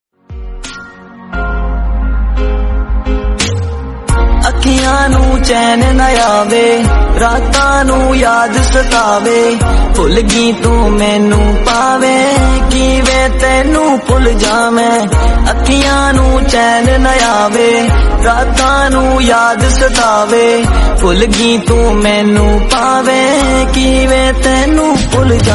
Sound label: Song Music, ringtone